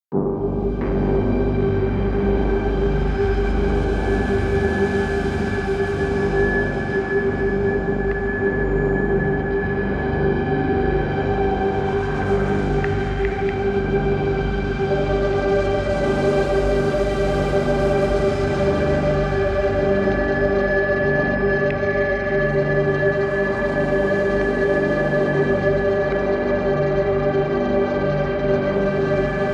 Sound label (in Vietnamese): Am thanh nen phim kinh di cang thang